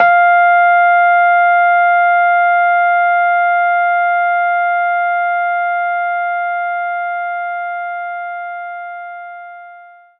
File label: Rhodes F4